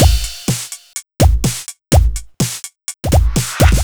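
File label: No percussion, Drum Loops 125bpm, Nu Dance Electro; Zero-G; Soundclash Nu Dance Electro; Acidized Wavs; Semi-Skimmed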